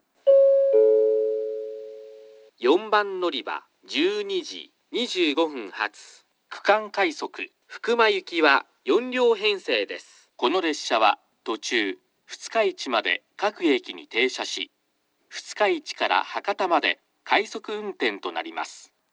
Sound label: kurume regional rapid fukuma, portable recording; 4番のりば案内放送（区間快速 福間行き）; ※二日市〜博多間快速